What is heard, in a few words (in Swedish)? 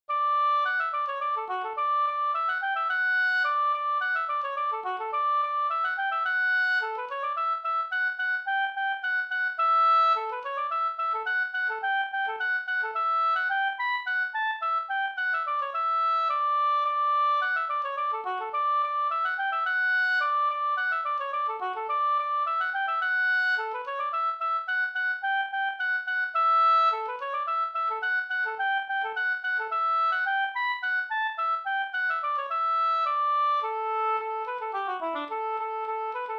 slangpolska